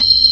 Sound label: B3, Drawbars; Keyboards; E-MU Producer Series Vol. 2; More Studio Essentials